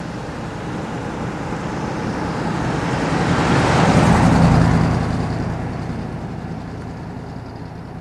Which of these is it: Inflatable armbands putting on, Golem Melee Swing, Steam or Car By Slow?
Car By Slow